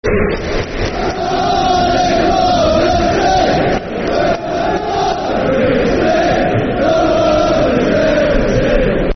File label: Hartlepool [Football Chant (Soccer Songs)]; Hartlepool Fc; fanchants; Hartlepool United Hufc